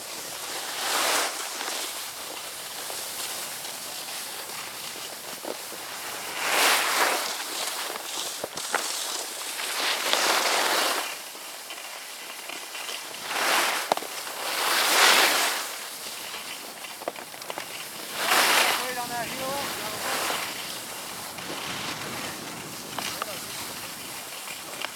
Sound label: Ski Slope Ambience; Sports; SFXSource